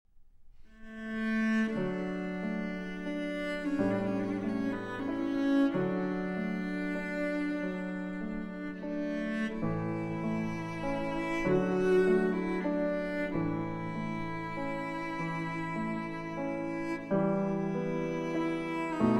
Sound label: Trio Brillant in A Major For Violin, Cello & Piano, Andante Allegrett; Op. 211 No. 2